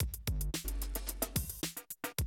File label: 110, Classic Drum Loops